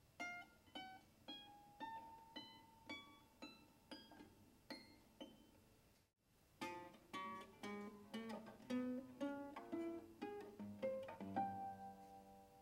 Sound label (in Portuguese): Sons tocados entre o trasto e o pente